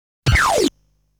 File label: Enemy's bullet fire sound; legend of hero tonma